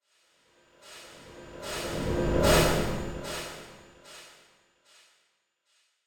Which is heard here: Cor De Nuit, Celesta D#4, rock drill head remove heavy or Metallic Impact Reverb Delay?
Metallic Impact Reverb Delay